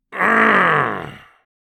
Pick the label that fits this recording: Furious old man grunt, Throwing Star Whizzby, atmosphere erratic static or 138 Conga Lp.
Furious old man grunt